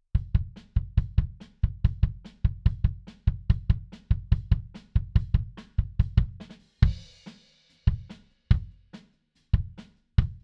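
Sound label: Bass Drum In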